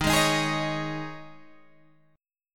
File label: D sharp 7th Suspended 4th, Guitar, Standard; D#7sus4 strummed; JGuitar